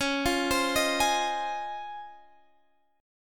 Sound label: C sharp Major 9th